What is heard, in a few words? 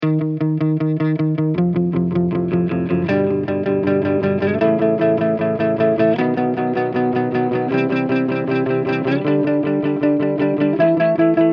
guitar up